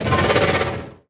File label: worm roar, creatures